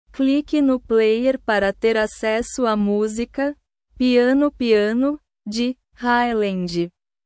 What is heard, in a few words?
piano